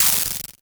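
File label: Klone Dual Percussion Synthesiser noise mod; KLONE FX NW8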